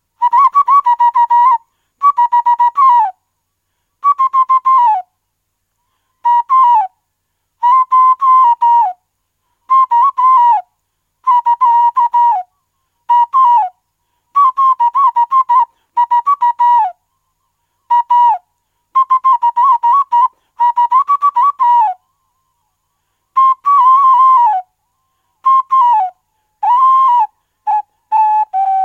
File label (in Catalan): flauta